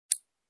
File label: Scissors Snip